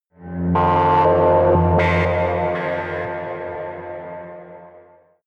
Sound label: sci-fi cosmic energy; horror